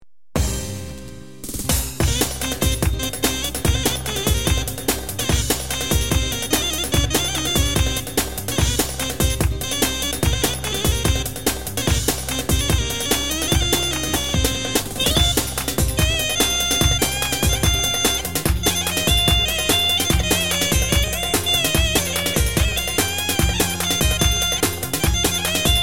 pa-500tr, korg pa 500 tr

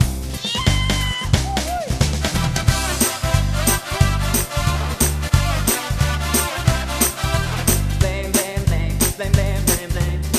VA-7 variphrase

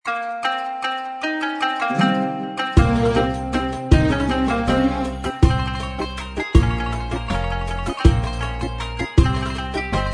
genesys pro oriental

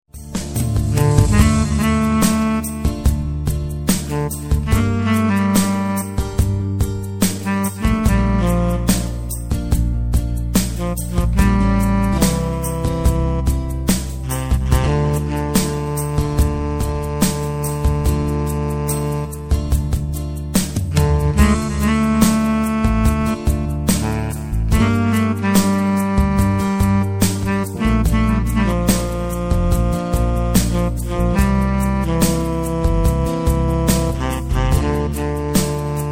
gw8 cls

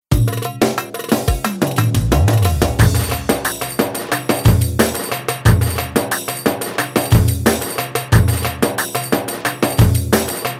tsiftetelli, ketron sx3000 ritim

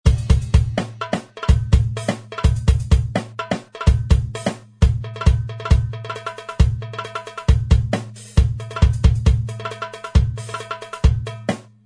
genesys pro oriental

